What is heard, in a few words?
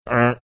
making funny sound